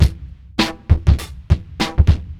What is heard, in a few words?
fat hard, Boombap drum loops